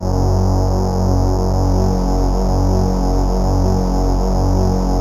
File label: SYN, ENSEMBLE; Best Service ProSamples vol.55 - Retro Sampler; AKAI